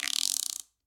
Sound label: Guiro Long; Latin Percussion; Drum Hits; Wood; Ableton; Factory Packs